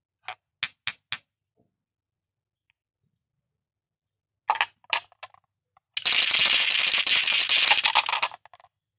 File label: Feng Shui ball